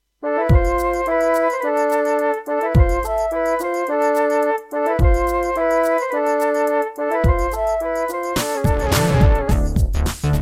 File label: Egypt, Serdaco MP32L; GeneralUser GS Bank; Lost Vikings